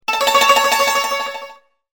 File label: YANGQIN